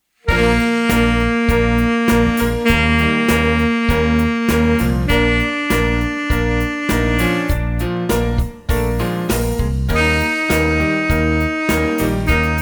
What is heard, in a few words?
Blow Blues; Del llibre de saxo, primer tema.